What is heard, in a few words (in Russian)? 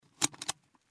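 shtamp, stamp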